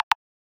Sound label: click; PPdastr Studio